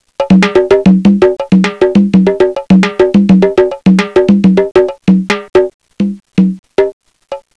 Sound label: drum